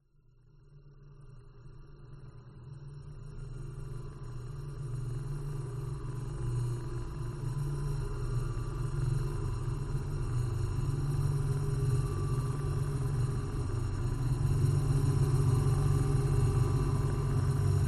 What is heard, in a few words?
sound design soundscape drone science fiction futuristic bright buzz; scott lawlor